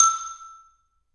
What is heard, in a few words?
xylophone e4; Percussion; Sonatina Symphonic Orchestra